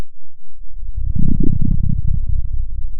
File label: Sound of a dinosaur